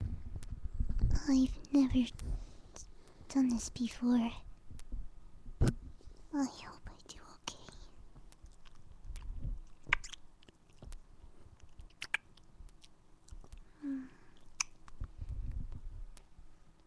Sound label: kissing sounds; Fluttershy